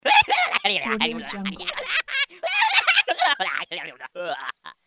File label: crazy cartoon creature laughter